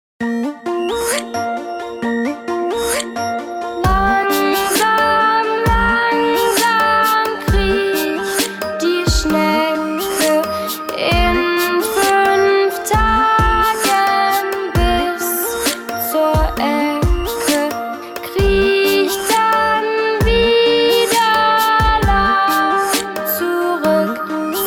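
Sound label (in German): Schneckenlied, kinderlieder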